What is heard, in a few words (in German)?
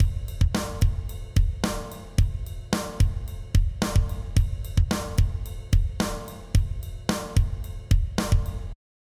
drums focusing eq passive mit saturation; softube passive-active pack testbericht